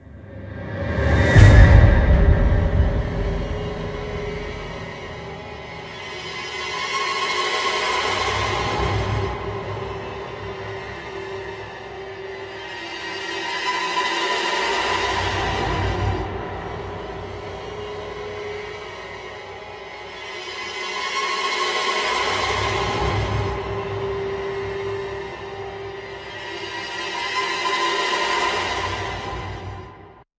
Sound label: Background Sequence; Clockwise; Windows